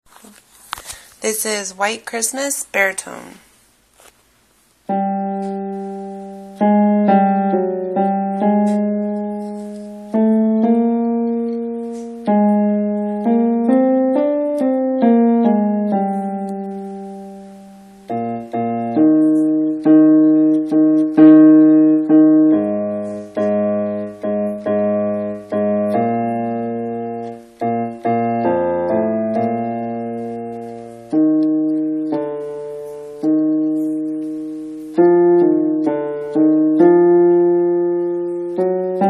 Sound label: baritone